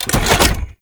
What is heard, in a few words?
Missile Close; Warframe; Corpus; Arachnoid Camper